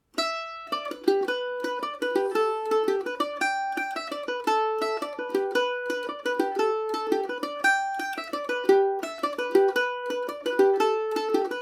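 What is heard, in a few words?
travers jig G major, irish mandolin; Paris Swing Macaferri mandolin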